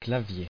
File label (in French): clavier